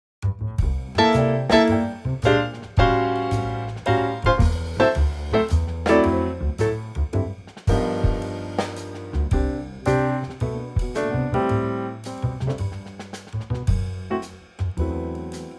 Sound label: C trio